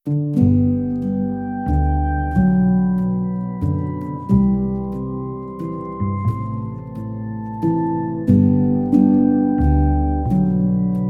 Instrumental

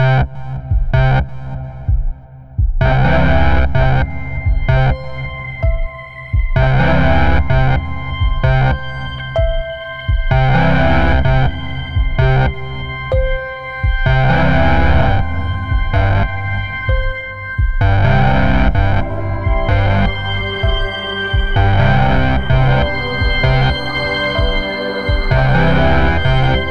Heavy Music Box; Panoramic - Ambient

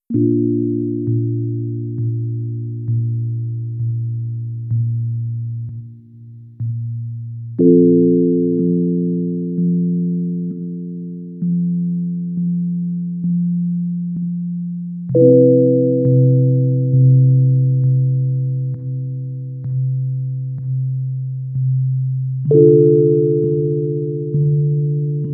Solo Instruments

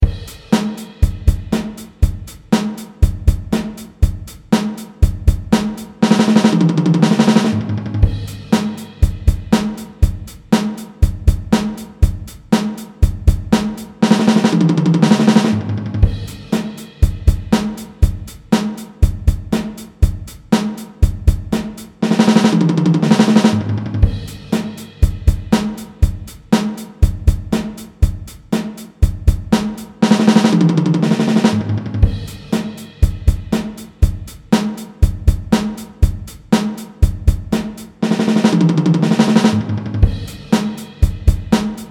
Fill, 120BPM, drums; Fill Dev 15 Part Two

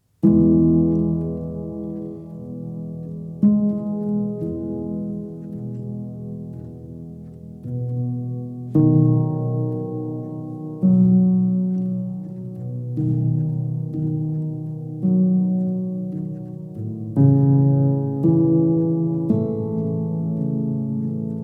Instrumental

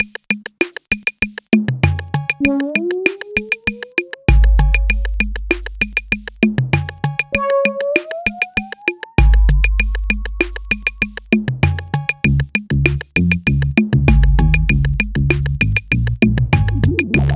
808 and Moog; Playful

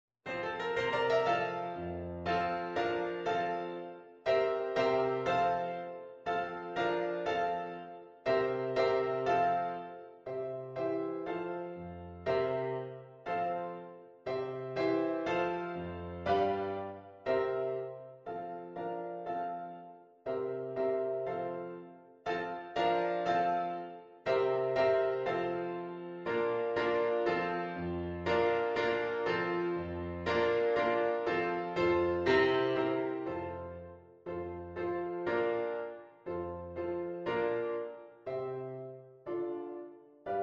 piano

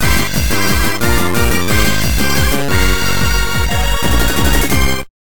Special Stage Clear; Sonic Advance 3

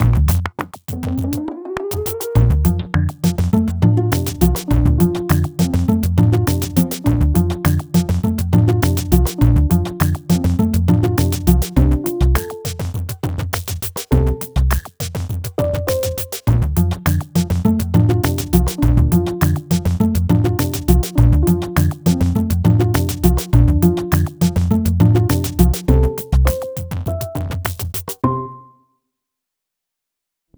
Rhythm and Strings

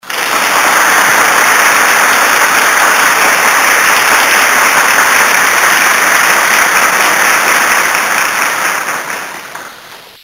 Sound effect applause; Sound Effects CD for Web

